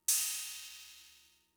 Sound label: Cymbal, Fricke MFB-522, Drum Machines